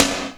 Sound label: treble heavy acoustic snare c sharp key